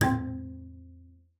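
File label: bass col legno C4; Xsample VOL-5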